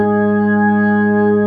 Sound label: B3 G#2; Best Service; Advanced Media Trax Modern Composer; B3 SMALL2